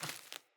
Sandals Run Vegetation, Footsteps; Player

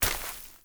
Grunt Step Veg, footsteps; Enemy; Corrupted Sproutfolk

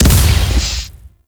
Ship Dual Cannon Moa Impact; Warframe; Weapons; Corpus

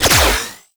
Beam Shot Fire; Warframe; Corpus; Glaxion Beam Moa

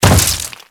Grineer Step Flesh, Footsteps; Enemies; Warframe

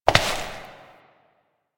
Step Rock; Warframe; Koumei

